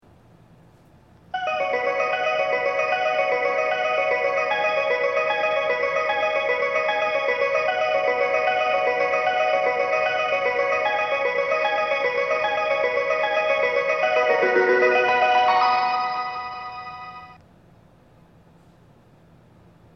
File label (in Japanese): 発車メロディー; フルコーラスです。途中切りや余韻切りが多いです。; 東海道線; 熱海・伊東・沼津方面; 4番線はスピーカーが少ないです。